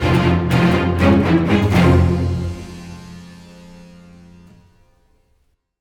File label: String; Assassin's Creed Unity Dead Kings